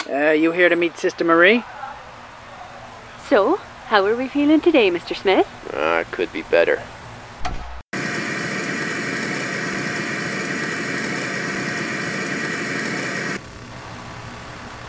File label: Television; Lucius III